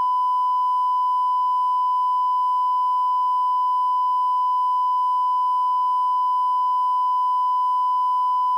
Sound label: fpga 1k